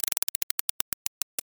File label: roulette